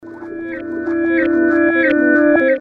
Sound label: pad